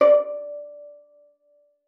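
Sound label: violin pizzicato D5; Xsample VOL-5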